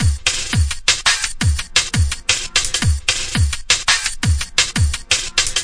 170 bpm sweet tripper filter, drum loops and breaks